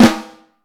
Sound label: FLAM, Snare Drum; Vintage; Q Up Arts; Johnny.C's Vintage Snare Drum; 5X14 LUD